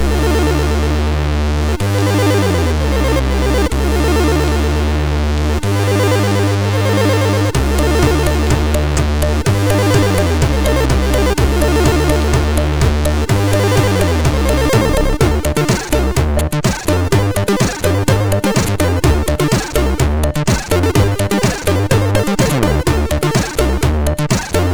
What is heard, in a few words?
Funky Stuff, MOS6581R2